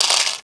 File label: coin; Caesar III